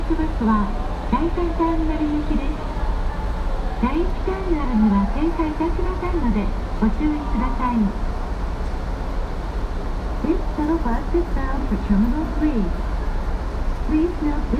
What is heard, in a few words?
bus melody